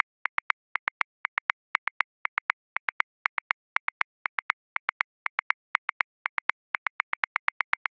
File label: Straight Rock, Drum Booth, Grooves; Ableton